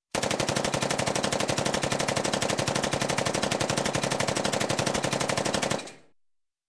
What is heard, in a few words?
Gun, Machine Gun 45 Cal. Sub-Machine Gun; One Medium Burst; Sound Ideas 2000 Series